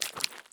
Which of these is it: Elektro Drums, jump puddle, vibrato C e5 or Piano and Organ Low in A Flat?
jump puddle